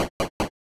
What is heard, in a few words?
stairs